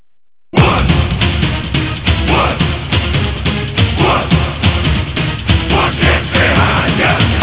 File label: whoosh